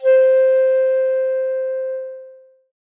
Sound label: Indian Flute C4; Winds